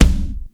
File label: KCK, SONIC DRUMS; Greytsounds VOL-1